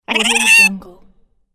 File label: cartoon bird jeer panic creak